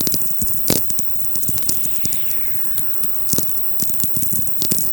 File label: one Whistle; norrskensberättelser